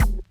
Kick

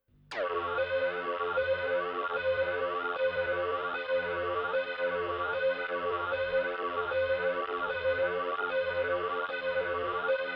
Synth Fairlight Intro

